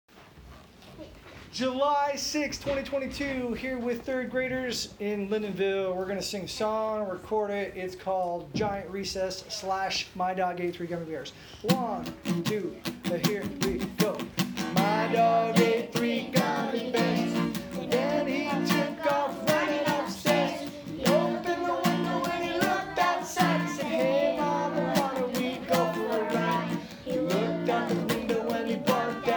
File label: Camp Songs; Giant Recess